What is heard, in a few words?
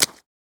Short foley